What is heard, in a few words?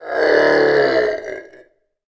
zombie; kult